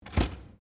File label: SHELF COLLAPSABLE RAISE, Fold up shelf in supermarket deli; Miscellaneous Bar